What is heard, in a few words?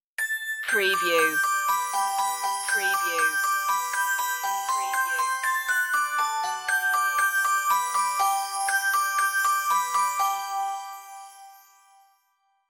merry christmas synthified stinger outro